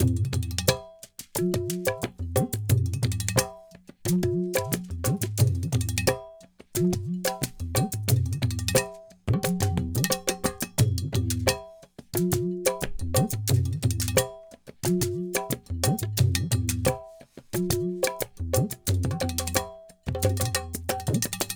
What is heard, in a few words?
perc mix 089 no duff, Hip Hop India, 089bpm; Hip Hop India Modern Percussion; Apple Loops